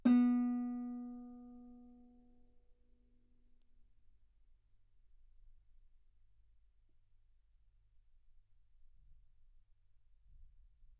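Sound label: Guitar ff B string B3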